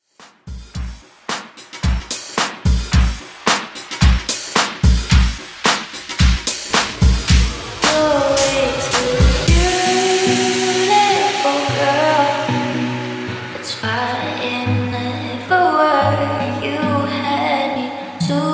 Urban Redrum 110 Bpm

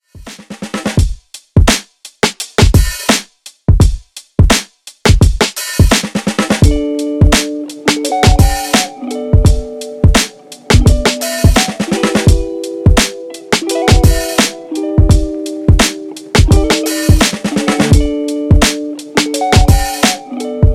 Old School HipHop 85Bpm

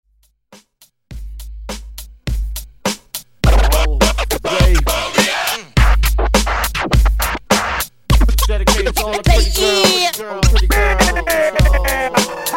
intro outro Clean 103Bpm

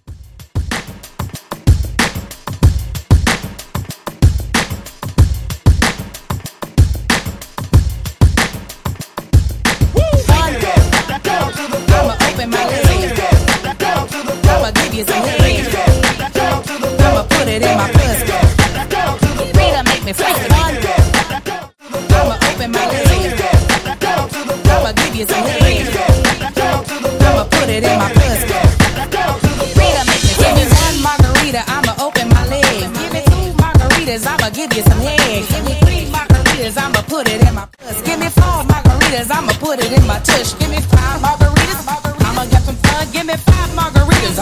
Hype Re Drum 94bpm

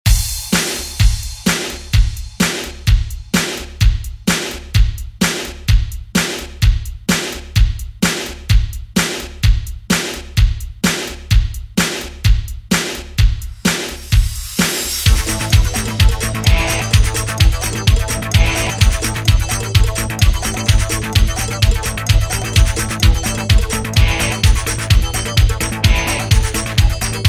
80s ReDrum; DJ Mhark

